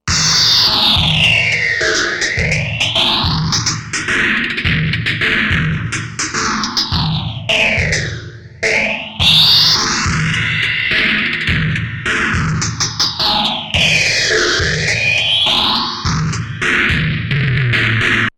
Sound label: distorto beat tcxii re